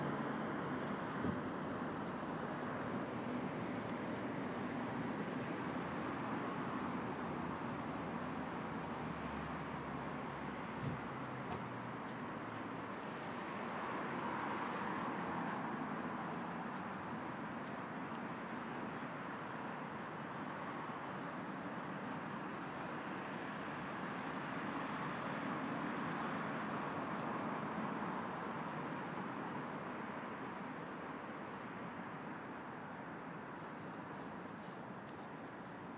60 seconds of morning car park ambience hull

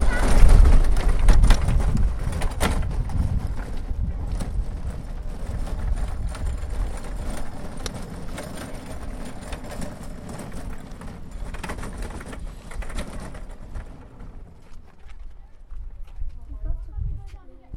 trolley

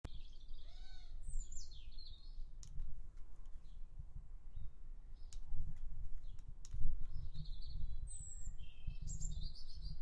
birds outside wind chime inside

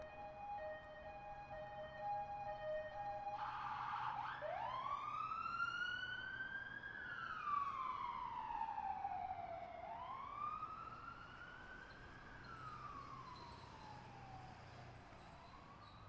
music of Baltimore

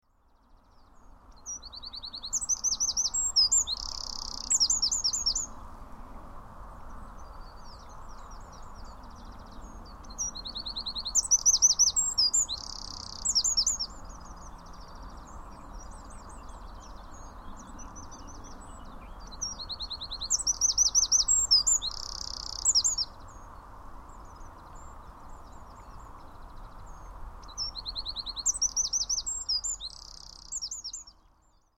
wren

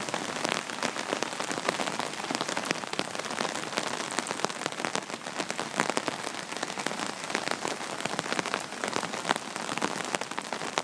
rain on my umbrella walking home from tramstop

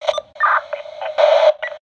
radio squelch

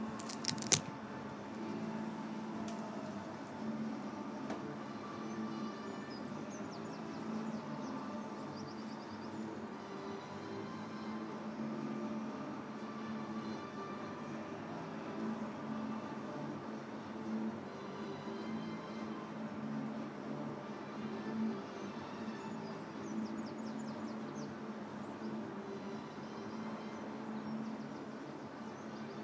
loud music blaring grrr

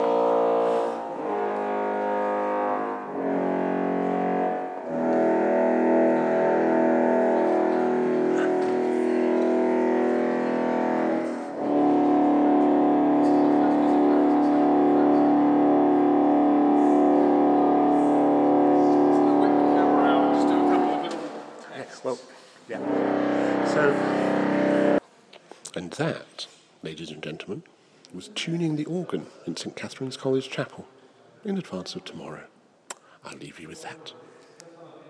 tuning up

